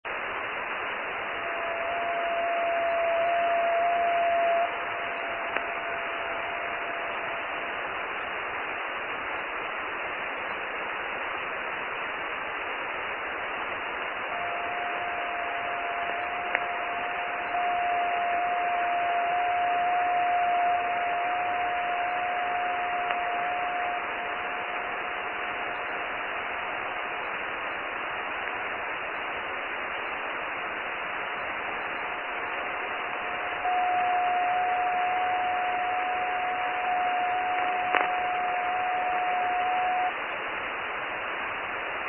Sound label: iss doppler; satellite scatter; graves